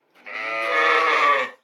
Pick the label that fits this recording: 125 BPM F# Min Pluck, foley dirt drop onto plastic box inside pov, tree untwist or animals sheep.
animals sheep